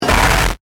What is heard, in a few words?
Distortion, Burst, Production Element, Imaging Element, Accent, Transition; Distortion Sound Effects